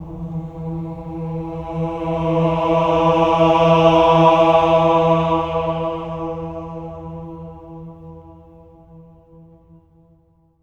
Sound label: AH E3, swell ahs, Symphony of Voices; Spectrasonics